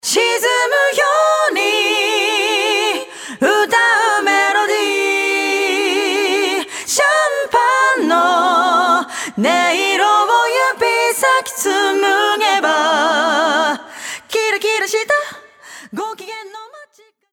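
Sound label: Jazz Waltz Chorus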